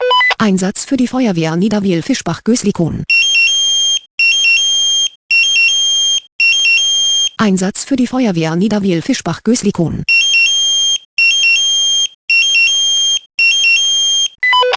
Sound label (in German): SMS Alarmierungstone